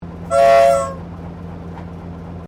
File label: Train Diesel TGR X Class 1951 Tasmanian Whistle; Bellarine Tourist railway vehicles; Trains